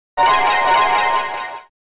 Switch Activation Jingle; Metroid Prime Hunters

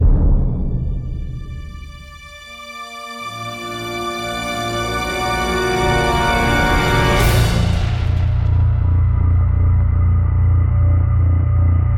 Battle Kill; Infinity Blade 3

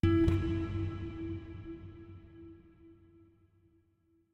Chime; chime sharp

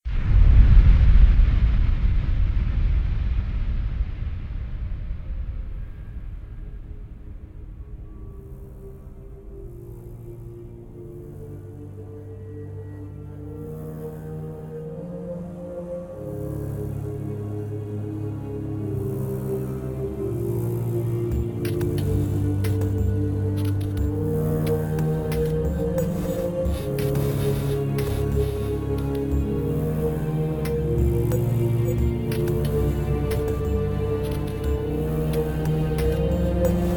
music death level; AirMech Command VR

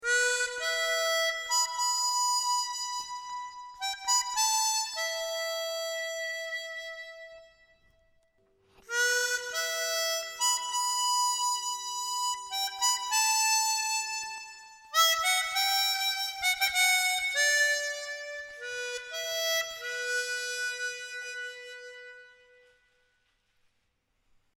Main Theme Harmonica; westerado double barreled

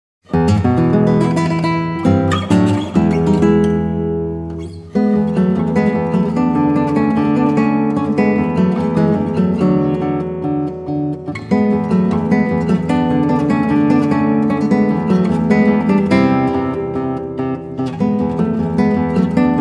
victory

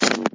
Button long

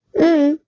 Hold scrotch fidgets head shake

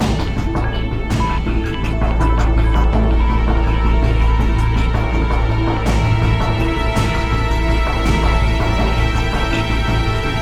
Scary Loop Bpm82; Murasama; Android

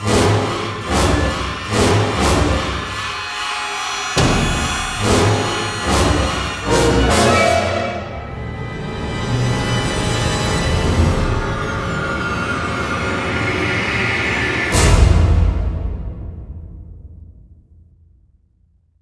Horror Stinger; rise of nightmares xbox 360 gamerip 2011

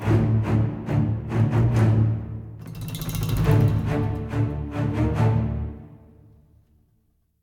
Action Chase 140bpm Intro; project zomboid

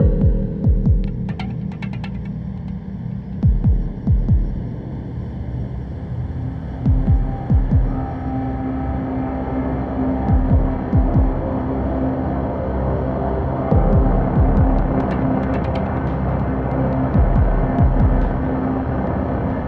Modern Dark Loop; Eternal Edge

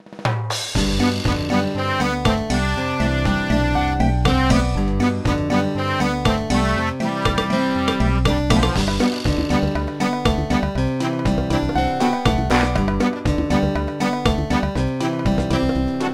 Swiming; Dream Salon; NDS

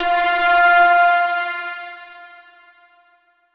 chime; chime sharp

